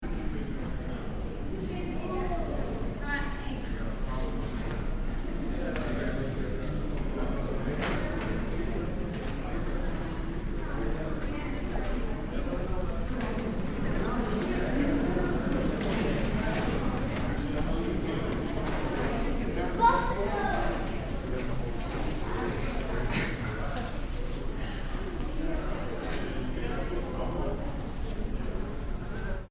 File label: Light Crowd Walla Small Hall Mammal Hall National History Museum New York